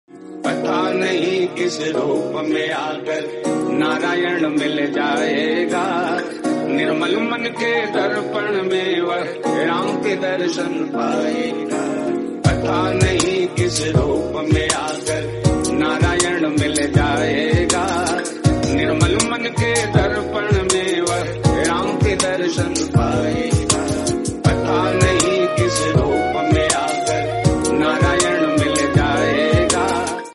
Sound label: Bhakti Hindi Devotional Lord Ram; ringtone; Narayan